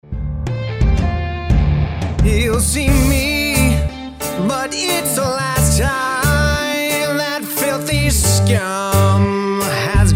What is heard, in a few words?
Singing Rock